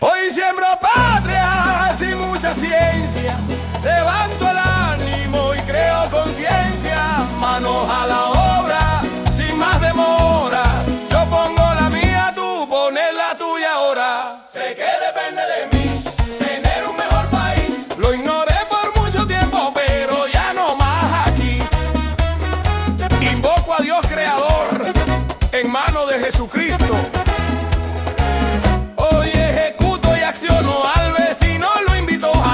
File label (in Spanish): Merengue